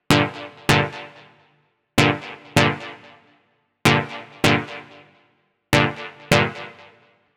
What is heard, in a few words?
128BPM Chords Root G; Vengeance Samples; Hopper Kit